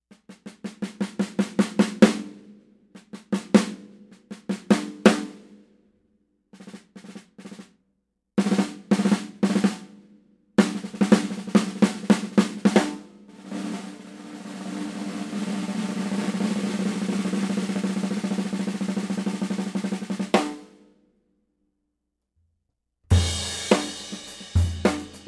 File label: Yamaha, 55x14; Roy Haynes